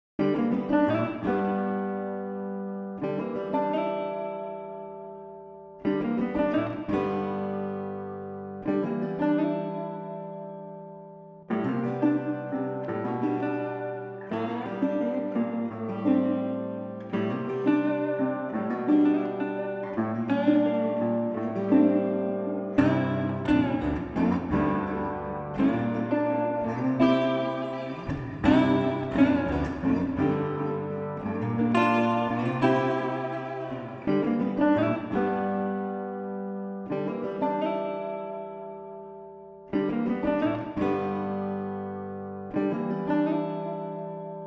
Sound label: Street Guitar Slide Lonesome Duet 85